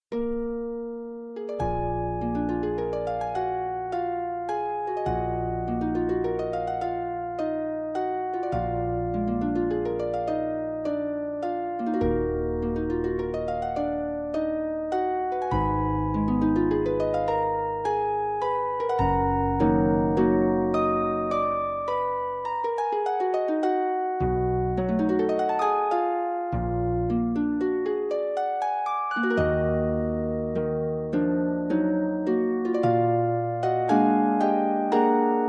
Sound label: Harp, Vidalita; Harp Music from Argentina